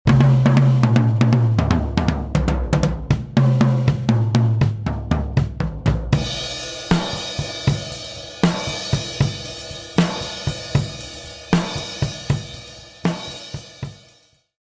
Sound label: Overheads On Stereo Bar